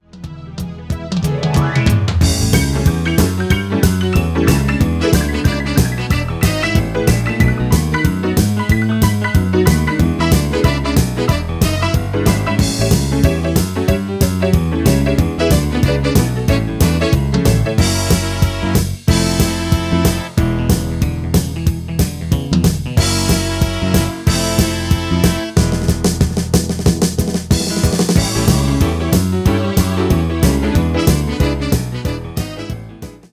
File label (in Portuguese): amostra country rock